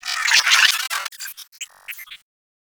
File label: Space Drone Attack; Enemies; Warframe; Corpus Space Drone